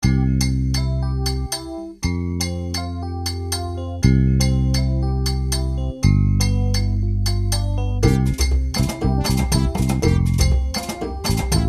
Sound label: Africa rhumba